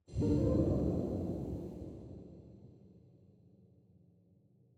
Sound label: ENERGY SFX Fill Complete; Cave SFX